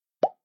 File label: bubble pop; dyslexia